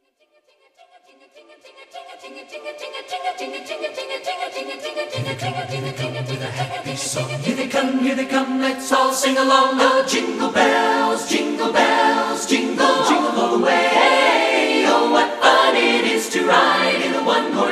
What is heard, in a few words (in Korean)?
아카펠라 캐롤 Jingle Bell; 성탄찬양; christmas